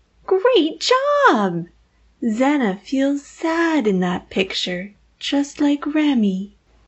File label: positive feedback